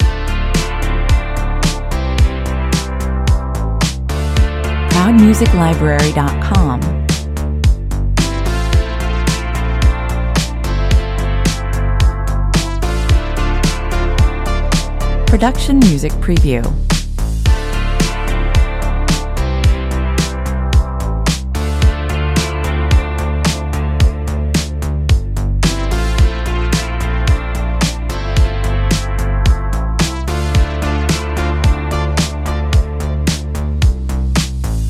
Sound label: loop